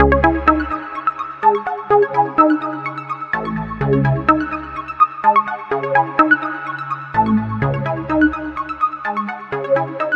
Arcade Pluck 126 C Min, future house

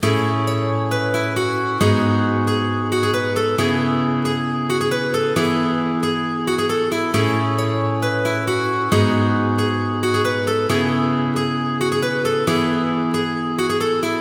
Acoustic Guitar 135 E, deep house

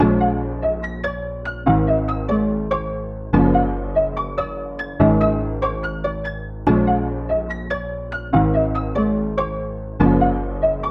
Melody E 144 BPM, trap

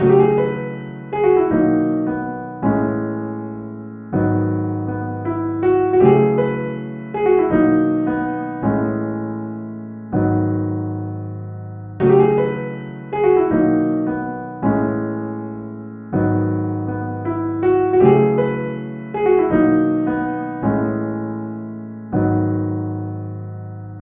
Keys 80 BPM Key G, lofi

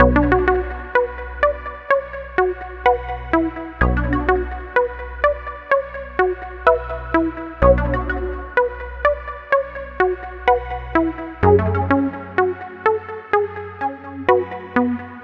Arcade Pluck 126 B Min; future house

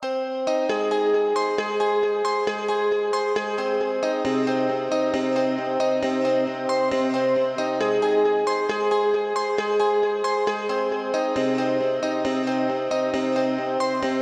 Electric Guitar 135 A♭, deep house

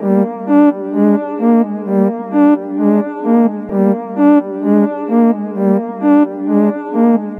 Keys G Minor 130 BPM, trap

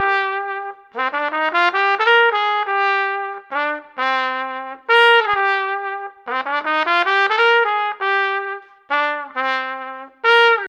Trumpet 90 BPM Key C Minor; hip hop rap; cadence

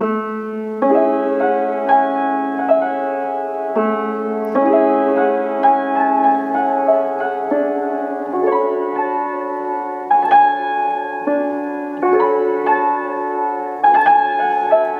Keys 128 BPM Key F, lofi keys

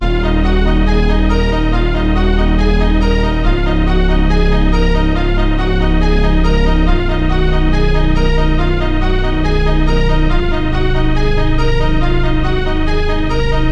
Strings 70 BPM Key Eb Minor, classical; euphony

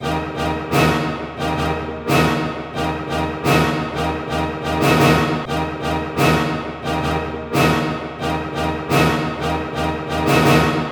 Strings 88 BPM Key Eb Major; classical; euphony

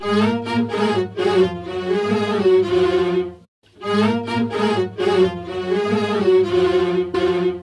Strings Loop 126 Fm, electro pop melodies

